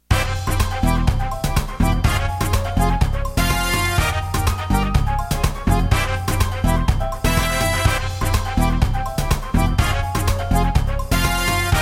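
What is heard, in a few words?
batucada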